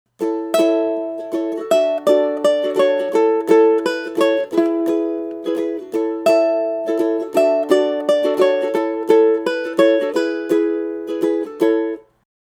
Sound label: Space Christmas Xylo